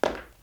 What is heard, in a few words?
Marble Foot Step; Welcome to the Game II